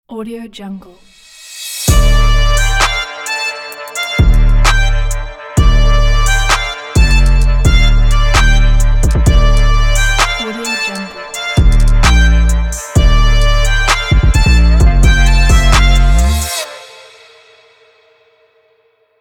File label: agressive hard urban trap logo